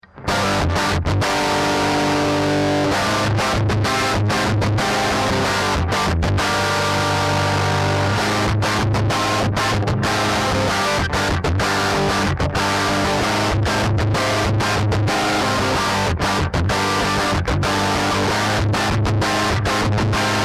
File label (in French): disto rythm; rythmique son disto sans reverb